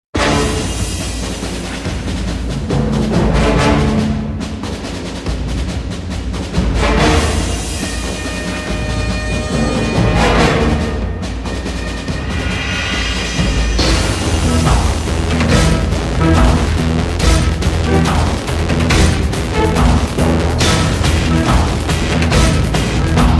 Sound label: battle; wot